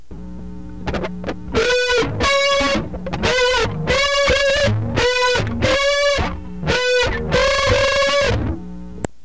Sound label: guitar four